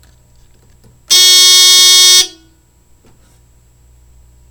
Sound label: Piezo